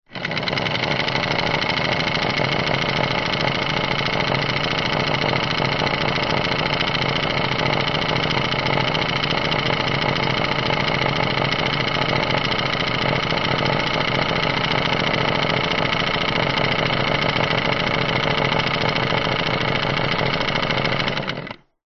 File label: industrial motor electric whir run operate